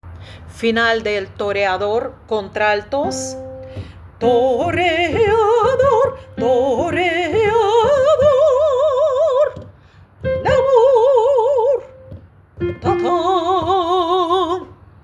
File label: contralto